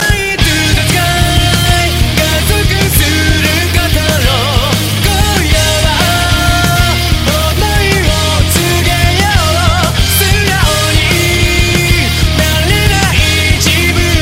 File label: English Song Ringtone